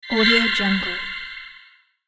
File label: casting spell fantasy wand wizard